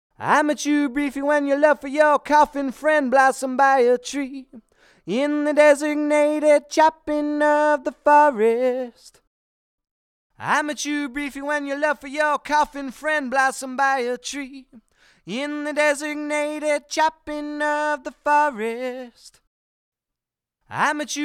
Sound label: vocal raw eq comp